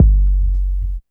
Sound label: Bassdrum Clean